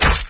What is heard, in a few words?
gib drop; guns n zombies 2014 pc